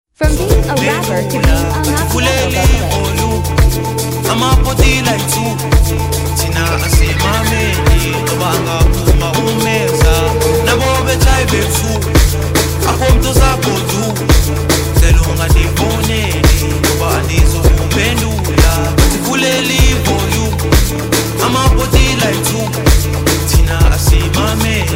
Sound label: Amapiano; Yonke Amapiano Dance Challenge; Mtabiseng